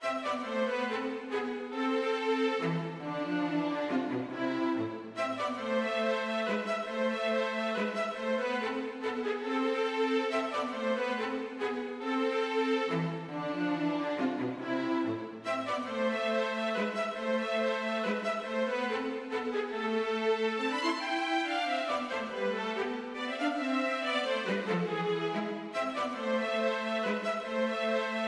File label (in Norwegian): Menue for fiolin og cello; Bang